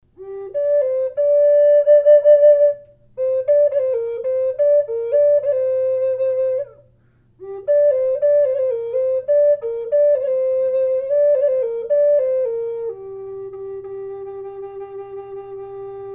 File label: arctic fox g minor, flutes; collector flutes